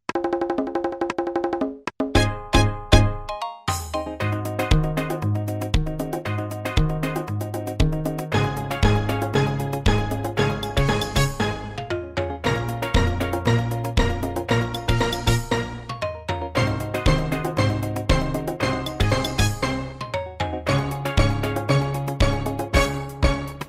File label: The E flat Calypso